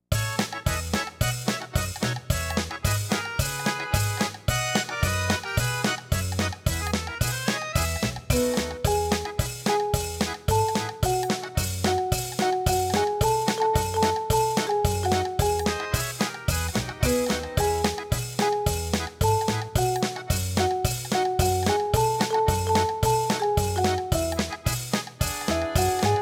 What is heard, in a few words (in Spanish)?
Regalos! (No Vocals)